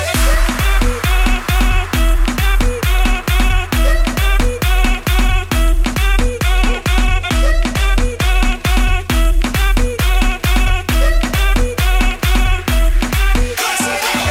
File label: latin explosion; multitrax